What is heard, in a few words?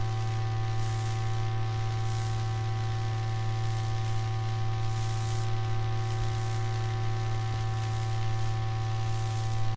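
Sewer Light loop; silent hill homecoming 2008 gamerip pc ps3 x360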